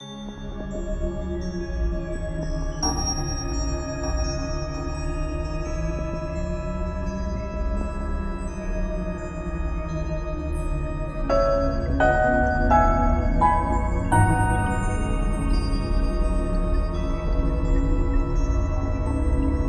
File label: quiet piano